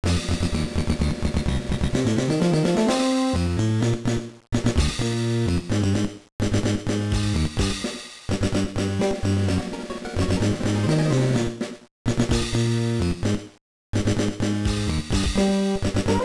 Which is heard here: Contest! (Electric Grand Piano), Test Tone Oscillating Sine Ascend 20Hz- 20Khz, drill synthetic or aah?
Contest! (Electric Grand Piano)